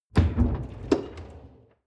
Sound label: folding door open short